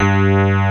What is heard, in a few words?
HARD RHODES; Pro-Rec - Synthsonic